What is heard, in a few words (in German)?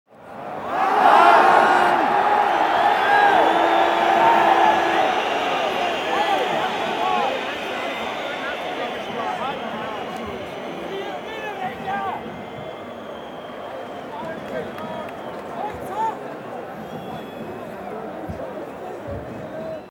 Stadion Atmosphaere